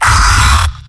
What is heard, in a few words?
m pain sharp; demoman; tf2; garrysmod; mvm